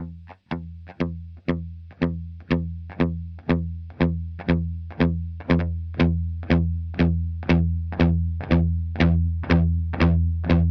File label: thc sunset 212 dynamik; THC Sunset Combo 212